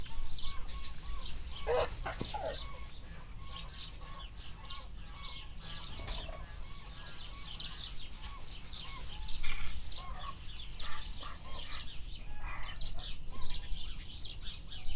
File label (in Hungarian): inkább nyöszörög, szeles idő, szamár; Hortobágyi Nemzeti Park